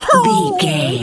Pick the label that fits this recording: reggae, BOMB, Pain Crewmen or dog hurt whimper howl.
dog hurt whimper howl